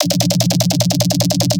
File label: Bass Drilling